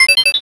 sparkle

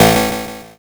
zap

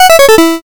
gameover

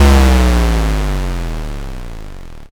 explosion l

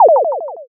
poyon

